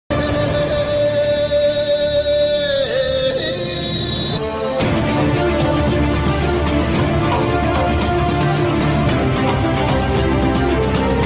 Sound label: osman background music ringtone fight scene; kurulus osman